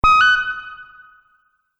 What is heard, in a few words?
Multimedia Event Notification, beep sound effect